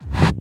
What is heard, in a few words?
BD Gate FX, Bassdrums; Vengeance Samples